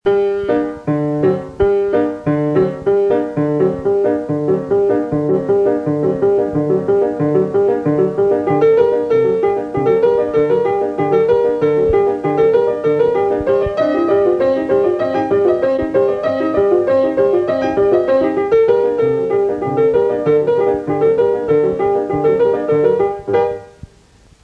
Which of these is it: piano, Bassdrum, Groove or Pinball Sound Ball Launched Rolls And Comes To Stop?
piano